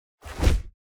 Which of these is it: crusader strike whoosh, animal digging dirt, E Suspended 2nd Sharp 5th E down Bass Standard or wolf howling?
crusader strike whoosh